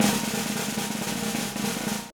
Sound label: snare roll, gretsch kit